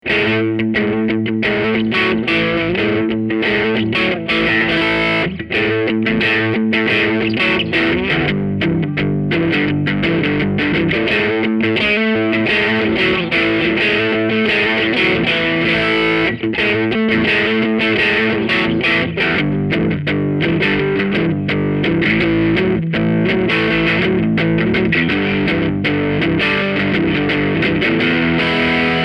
Rock; PRS 513

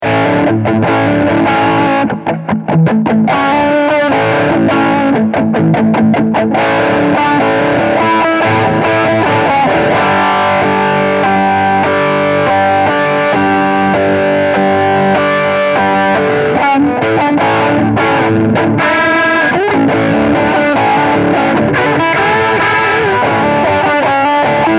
Rock Song

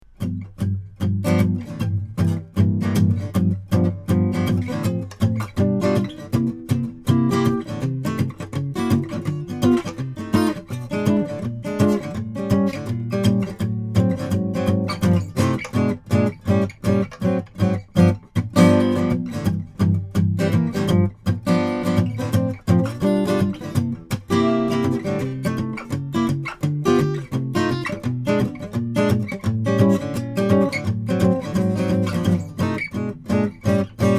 Jazz; AMI UM25 Proto

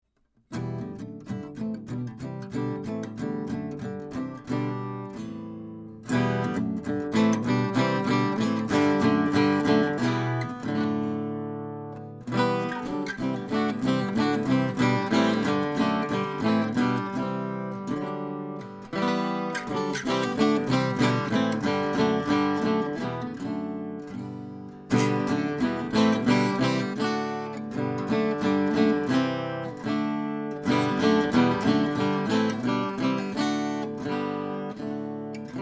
Chord Improv, Gibson L50; Comping Impro; Fast chords in Em

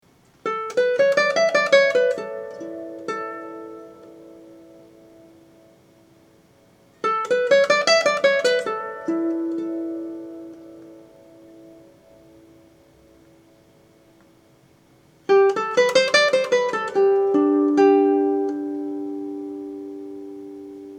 Resonance, Cathedral Guitars

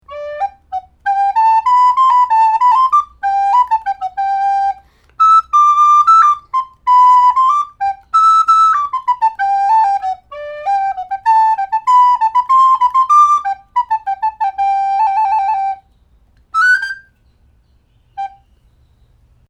Soprano; Schoeps CMT 341 VINTAGE; Presonus ADL / 600; Rosetta 200

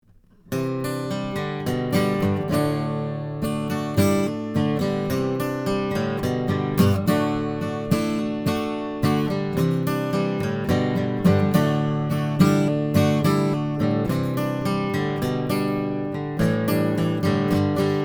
Strum

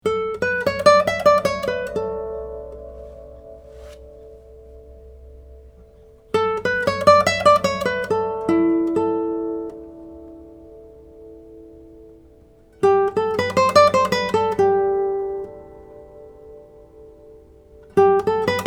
Resonance; Resonance Test (Stopped scales / chords showing sympathetic / resonance); McBroom

